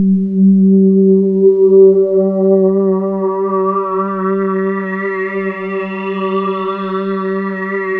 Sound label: POLY-6; SQ SWEP; Rubber Chicken Software Sample Bank; KORG